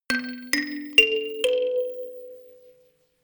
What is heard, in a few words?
notification kalimba rattle sequence ascend